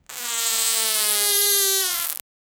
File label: a plant cell dying; biological